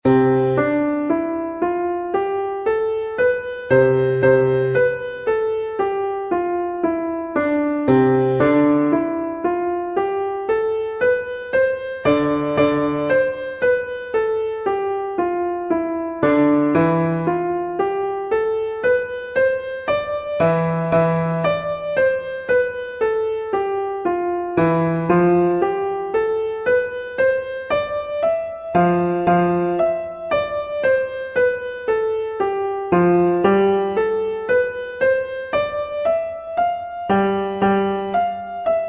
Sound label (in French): gammes modes